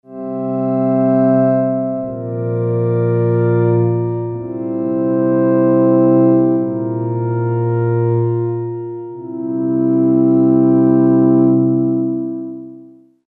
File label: Generic Pad; UA Masterclass; Pads